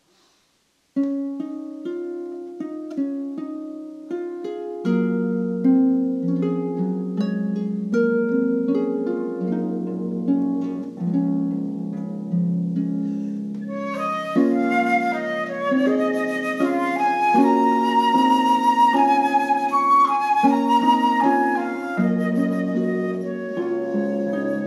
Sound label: Celtic Tune